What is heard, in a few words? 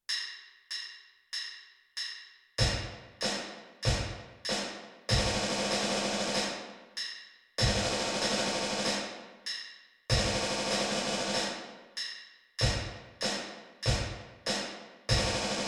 merrily we roll along snare drum bass drum